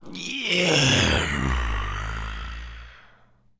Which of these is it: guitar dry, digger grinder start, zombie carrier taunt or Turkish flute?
zombie carrier taunt